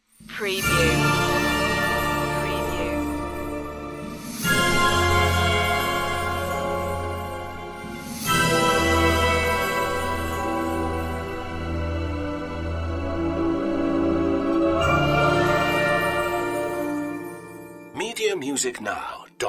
stingers

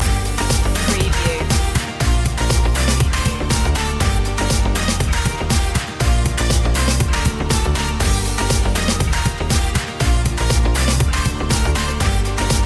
latin groove loop; media music now

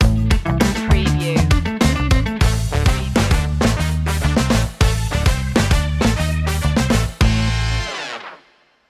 funk stinger

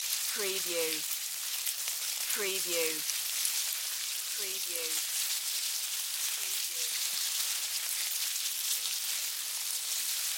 Hive Bug Movement; sound-effects-bible

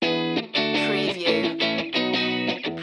surf loop

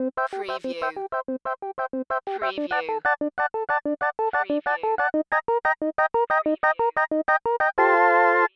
Music Organ March

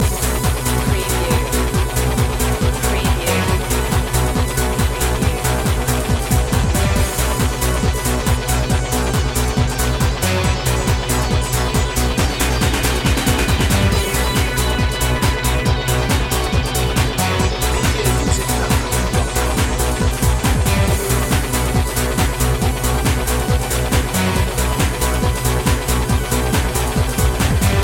accelerator loop

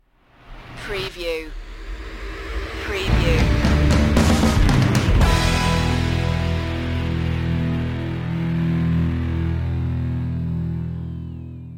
idents heavy load

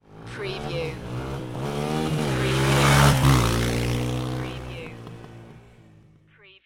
Motorcycle Dirt Bike Drive By Left To Right

